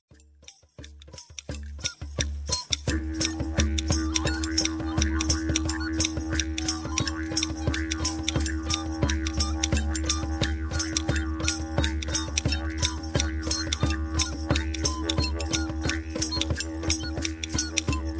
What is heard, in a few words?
flute man the shepherd on ship